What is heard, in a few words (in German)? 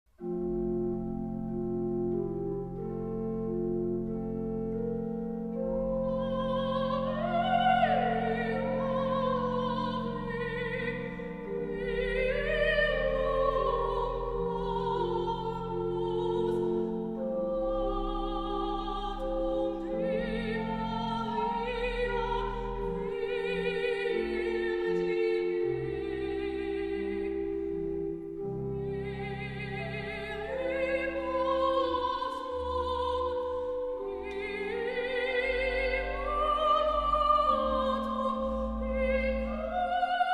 Sopran- Ave Verum